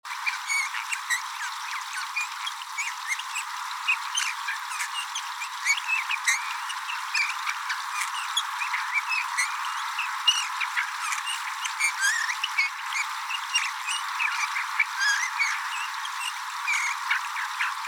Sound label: Pyrrhula pyrrhula; SETE